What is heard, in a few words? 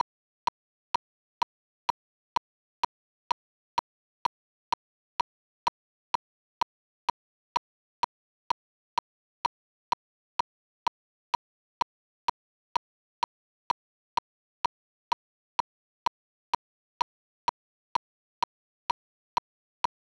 click